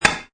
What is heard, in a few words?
Metal Tap; Metallic; Multimedia; Lynne Music